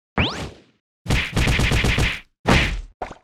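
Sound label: attack; hero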